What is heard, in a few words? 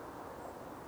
Flight Call